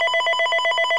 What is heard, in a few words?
beep